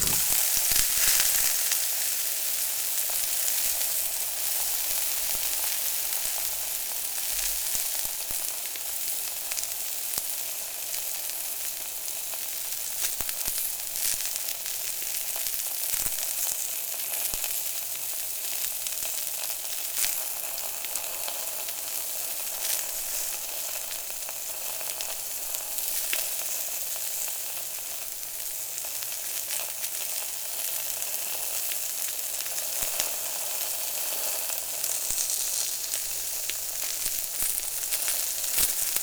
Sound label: frying pan, general household sound effects